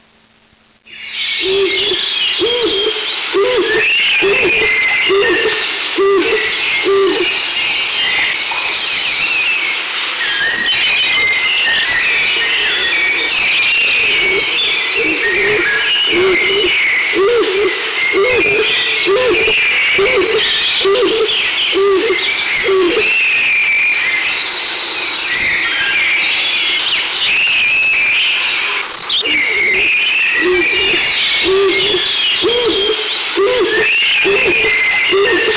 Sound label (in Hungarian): kek galamb